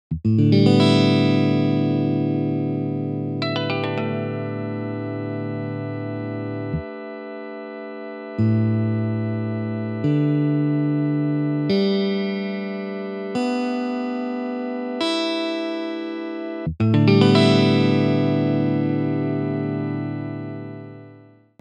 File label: Crowd xADGBE; crowdsourced tunings; ragajunglism